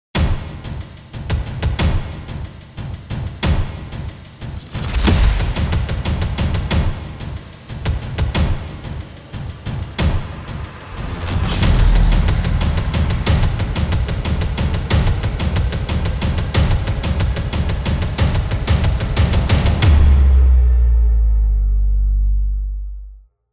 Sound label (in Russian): naprjazhenie nagnetaet